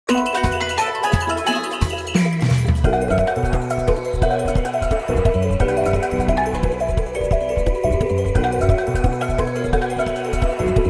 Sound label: Dolphin Shoals Final Lap, Underwater Cave; Mario Kart 8; (Unused)